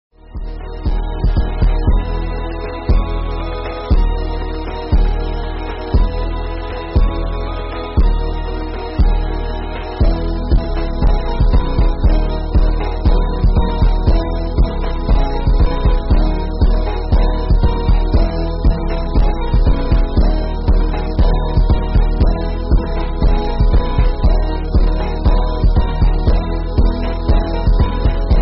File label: sad music